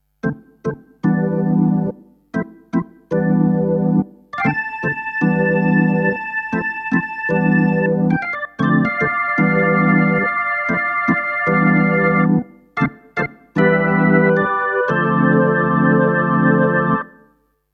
P115 Jazz Orgel

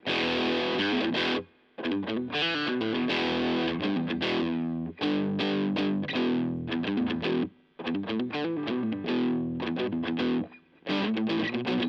DC 60 Junior Zerre; Harley Benton

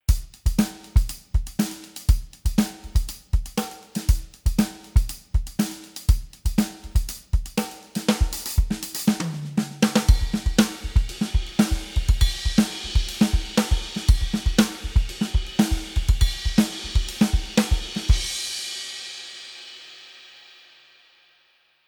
Drums ohne MC

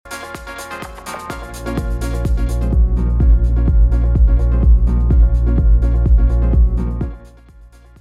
Reloop RMX 10BT nur Bass

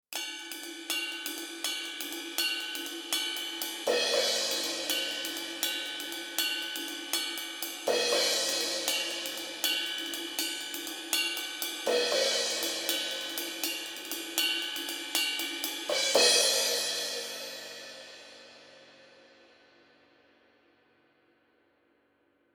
MPEQ 1 OH EQ ohne Transformator; UnderToneAudio

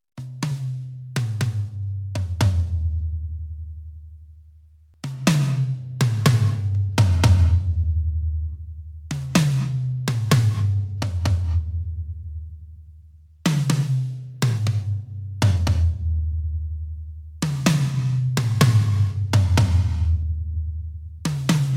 Tomlauf; YAMAHA DTX6K3; EFX Beispiele

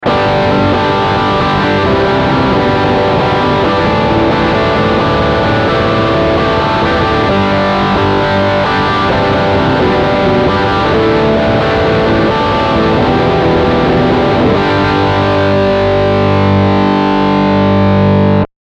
Steg Humbucker Riff Distort

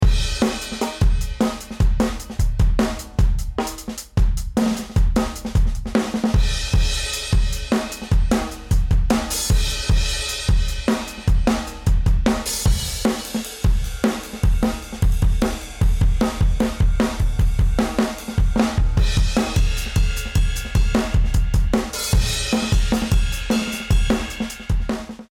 Schlagzeug VC-76 Ratio 4 7 dB Gainreduction; Attack 5 8 Release 7

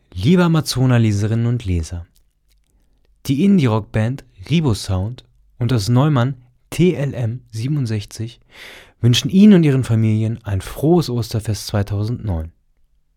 klangbeispiel tlm 67

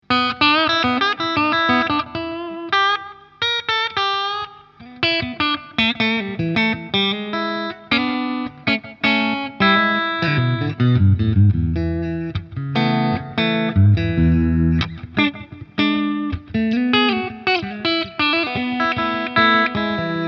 Framus Idolmaker Mitte clean

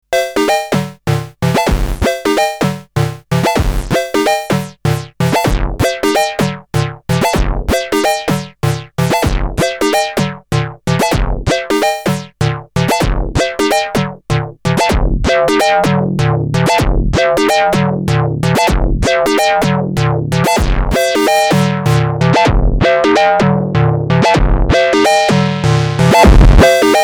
Behringer Model D Main Out rückgeführt zum EXT in Overdrive

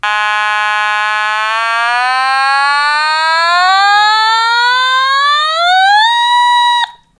Otamatone mittlere Range

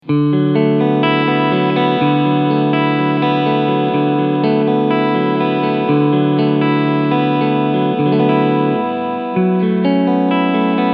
Cleansound beide PUs

